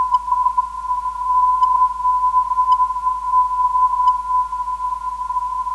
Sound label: YG 396kHz, ndb